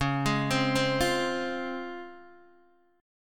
C sharp Major 7th C sharp Guitar DADGAD

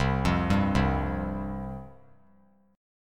C Suspended 4th C; Csus4 arpeggiated; 12,12,10,10; JGuitar

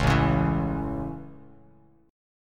A Major, Bass Standard; strummed

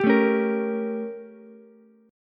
A 7th Flat 9th A, Ukulele; strummed

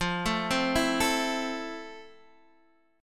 F Major 7th F; arpeggiated; JGuitar

